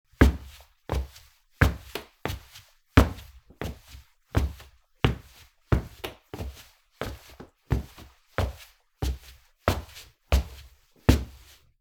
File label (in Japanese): 古びたフローリングの歩く音; walk